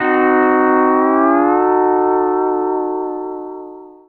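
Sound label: pedal steel chord C dim7 R, Country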